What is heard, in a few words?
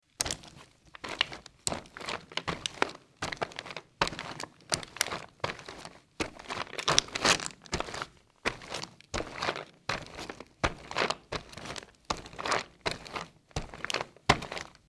Gravel Walking, Footsteps; Todd Blankenship